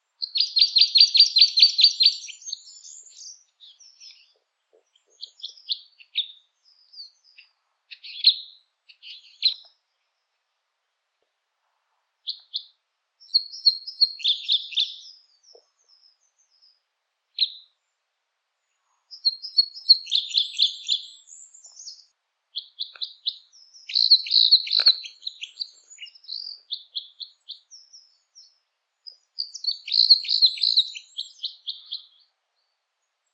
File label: Red Crossbill Loxia curvirostra